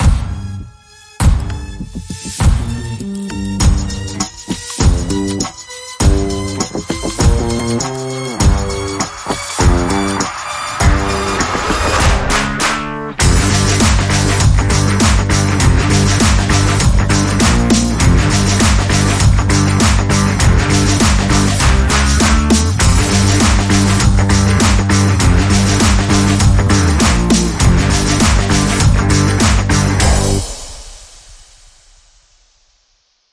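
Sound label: No Lead No Vocals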